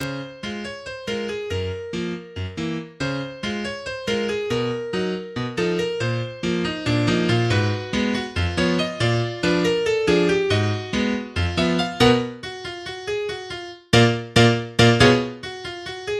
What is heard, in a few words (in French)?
exercise pour piano